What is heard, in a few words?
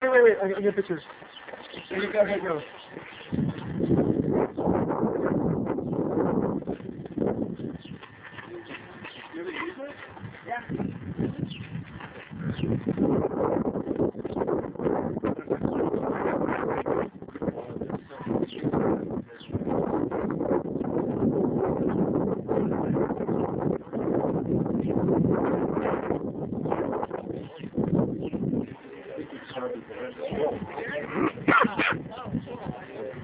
horse drawn, phantom coaches